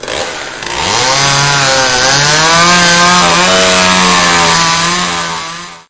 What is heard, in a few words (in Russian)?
ceplnaya pila rezkii blizkii priglushennii